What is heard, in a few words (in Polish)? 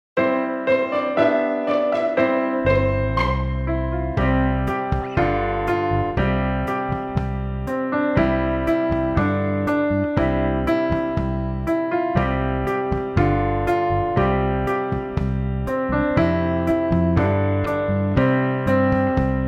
Hymn Podkład